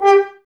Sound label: G, Brass, F.Horns, Woodwind Brass Ensemble; Sample CDs; Miroslav Vitous